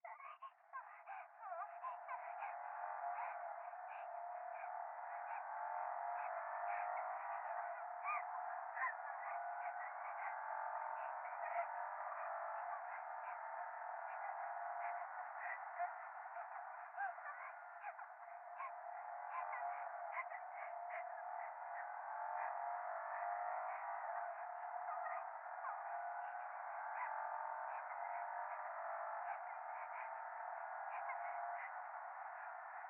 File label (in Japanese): 可愛い喘ぎ 車の中